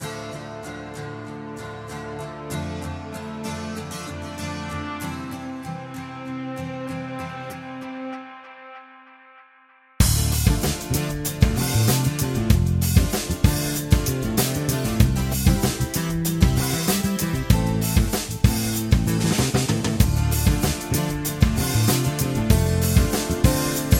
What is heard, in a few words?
virtual guitarist amber